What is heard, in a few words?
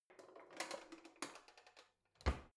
old drawer creaky slide softly in